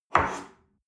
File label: espresso grab from table slide soft, restaurant cafe